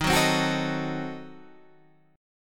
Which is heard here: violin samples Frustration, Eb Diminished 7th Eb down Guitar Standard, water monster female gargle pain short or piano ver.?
Eb Diminished 7th Eb down Guitar Standard